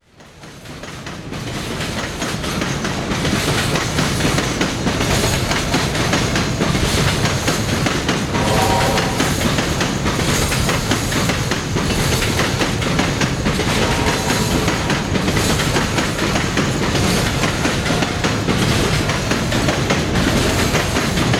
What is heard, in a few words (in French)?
bruit joints de rails; sonotheque